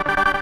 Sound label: synth